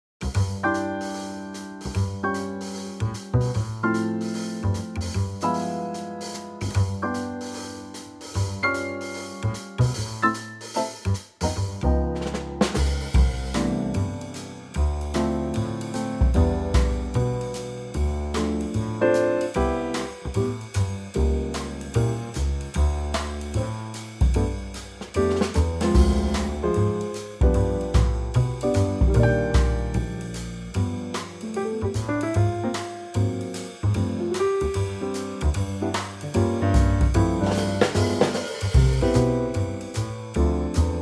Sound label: C Trio